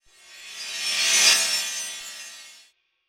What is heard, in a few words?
Wild Animate; OceansGold; netent